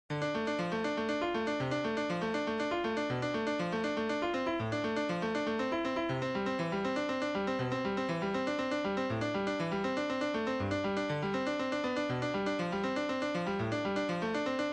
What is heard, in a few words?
Prelude In 17tet